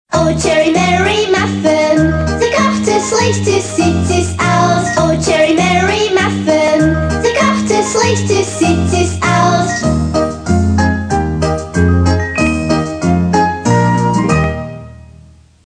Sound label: German song